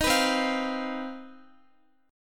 C Minor Major 11th C down Guitar 4F 4A 5C 5G 5C 6E; CmM11 strummed; JGuitar